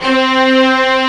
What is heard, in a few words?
Section String C3; Proteus 2000; Digital Sound Factory Proteus 2000